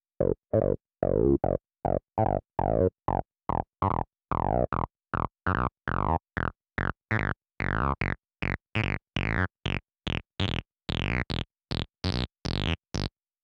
Bassline; chippy

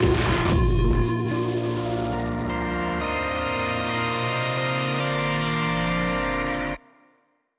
LEVEL COMPLETE STING 120BPM 4-4 STING Bb; Arca's Path VR

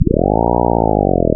Boss Hurt; Multiponk; android

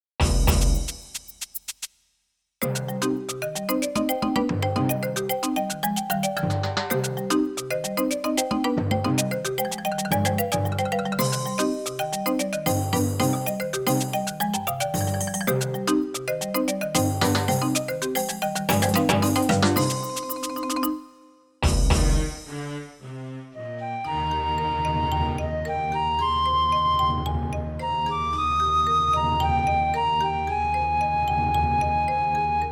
Jungle

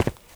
Ground Tap; blastmorph pinball; xbox series xs

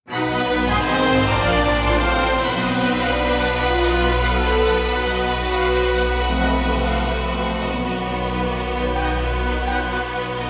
Boss victory stinger Music; Slay the Spire

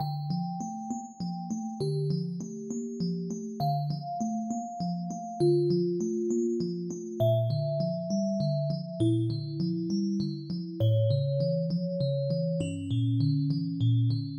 Mood Music; Hellenica; macos windows gamerip 2017

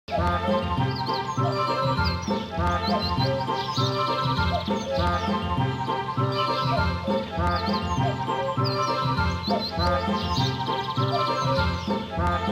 Hub Music Box; Piglets Big Game; GC; PS2

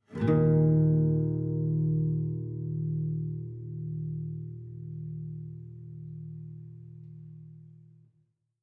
Savannah Music

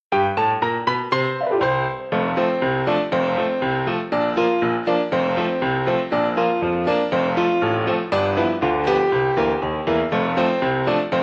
Song Kittens Piano; baron fur is gonna fly; switch windows xbox one

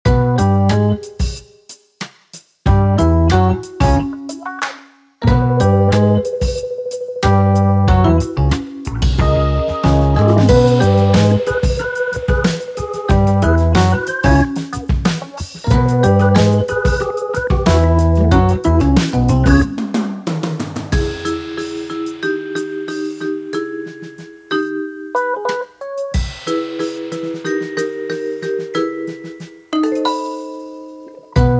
Music Menu event

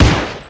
Rifle; MilkChoco

